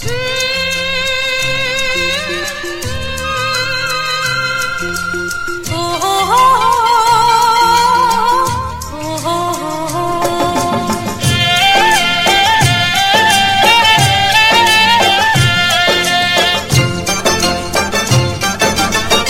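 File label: Ringtone Bhojpuri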